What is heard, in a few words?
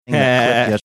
laugh; peter griffin like